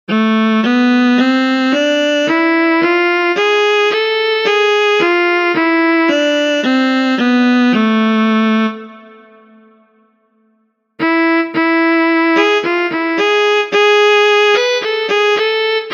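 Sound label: Harmonic Minor Example; This melody begins on the fifth note of the scale.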